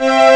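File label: string chord; Amiga Soundtracker Sample Packs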